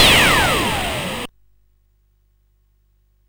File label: Synth Sample, CSG8580R5